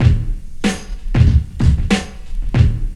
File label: LOOP, DRUM; E-Lab; X Static Goldmine 2